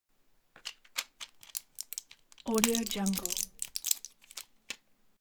tablet blister opening

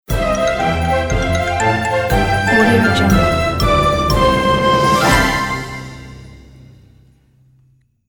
funny miniature; logotunes

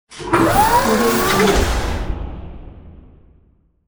hydraulic switch on

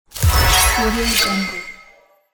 magical ability

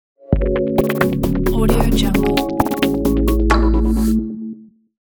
luxury logo reveal; wellmein

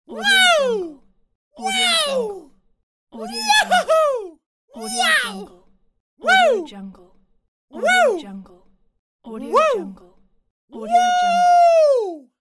male voice woo; medusamusiclab

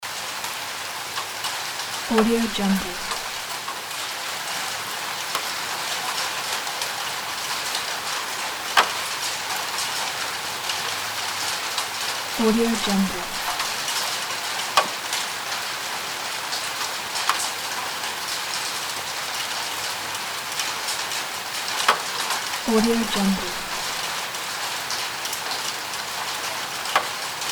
rain pack

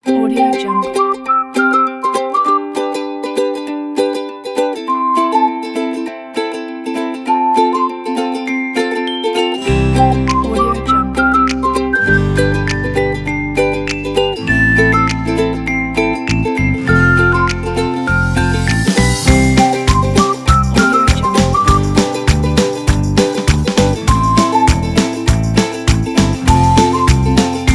be happy

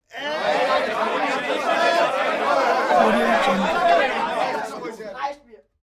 human crowd male crowd grumpy short; smartsoundfx

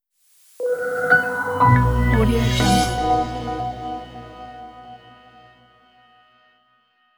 sophisticated opening logo

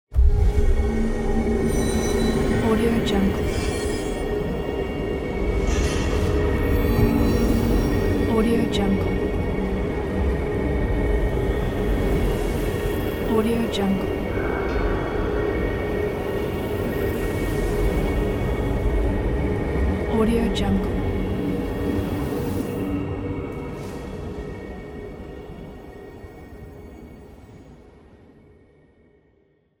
paranormal activity atmosphere

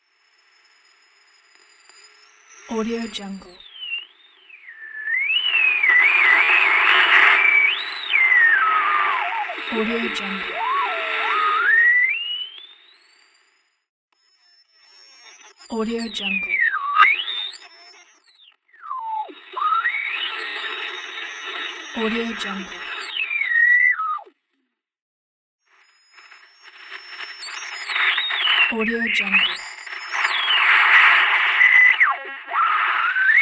radio tuning

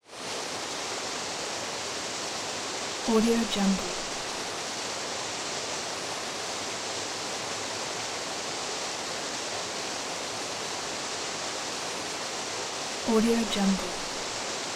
waterfall large rushing water lake district

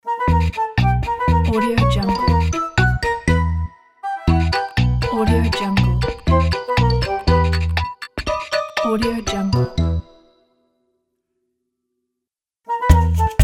happy hopping logo; groovestudio

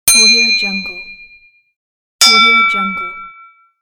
bell; klassikua

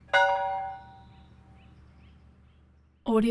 church bells

